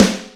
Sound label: Yamaha CS6, Drums